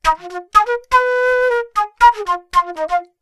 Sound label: Flute No Reverb